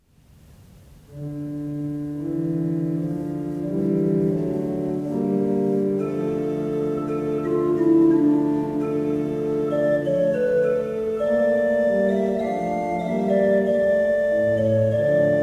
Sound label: Organ- Recit du ton 1; JuevesSanto Cena LavaboPedes